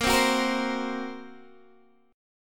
Bb Suspended 2nd Sharp 5th Bb Guitar Open D

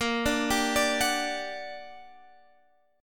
G Minor Major 7th, Bb, guitar chord

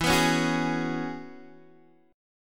E 6th Add 9th E down Guitar Open G

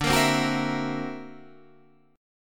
D Augmented 9th Guitar Half Step Down, chord

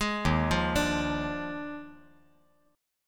D sharp Major 7th Suspended 2nd Suspended 4th; arpeggiated; JGuitar

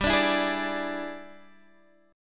E 7th G, guitar; E7/G; strummed

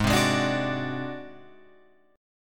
A flat 7th Flat 5th A flat Guitar Open F, chord; Ab7b5; 8,7,8,9,9,9; strummed; chordshape; JGuitar

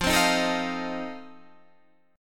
F 7th F down Guitar Standard; F7 strummed

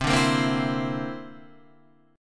D 7th Suspended 2nd Sharp 5th C

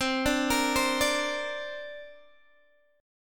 C 7th Suspended 2nd, Guitar, DADGAD

